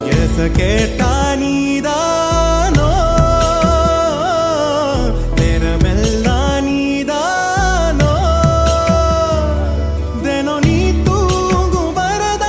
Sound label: male ringtone; tamil ringtones